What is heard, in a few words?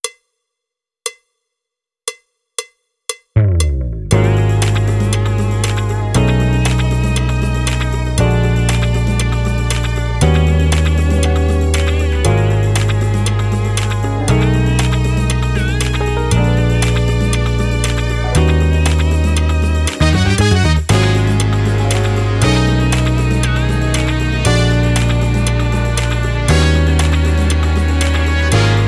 Funk drumless